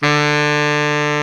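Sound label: SAX Baritone Sax D 3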